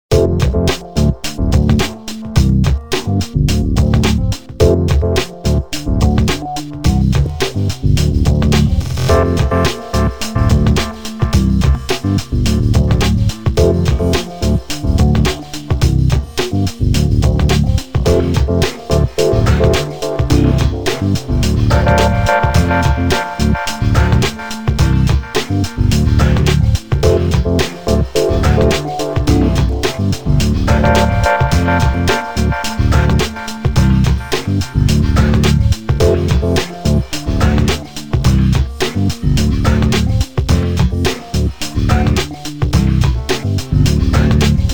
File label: Music Bar